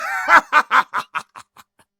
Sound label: pirate captain laugh; arrgh-hoops